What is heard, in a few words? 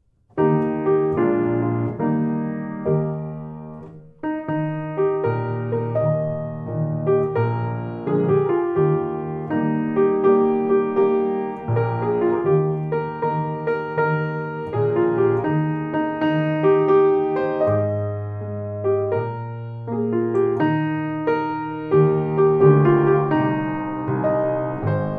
piano